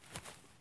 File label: jump grass low; Escape from Tarkov